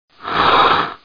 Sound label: Critter Hiss Pan By